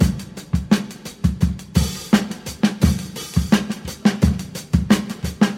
114 bpm drum loop f key, Free breakbeat sample - kick tuned to the F note. Loudest frequency: 915Hz